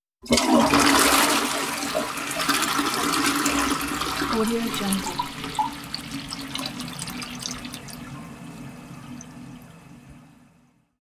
toilet flushing

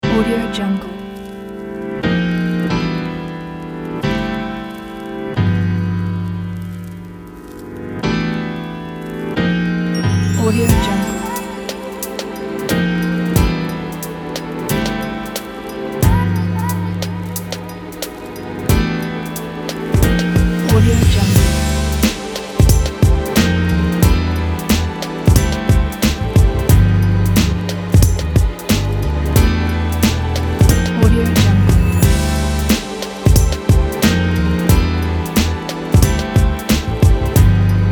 chill cooking

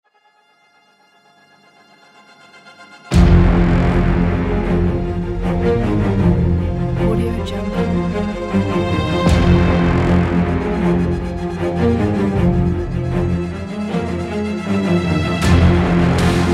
heroic trailer

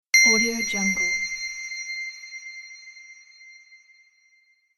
bells sound; ib music

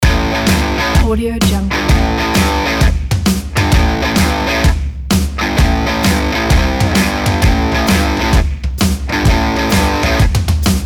rock guitar

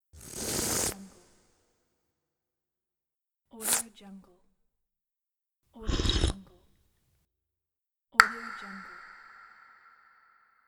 slurping tongue

